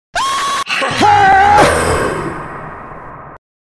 jumpscare sound

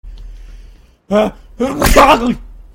the perfect sneeze

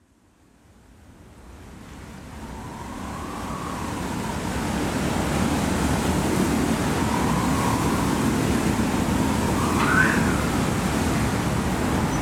wind ghustling through

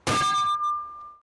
sigh

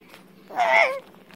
death sound

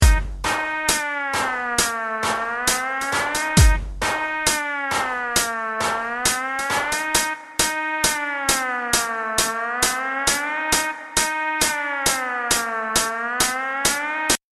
alarm, pullover alarm

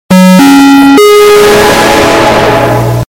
windows 11 startup loud

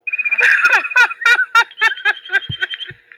crying

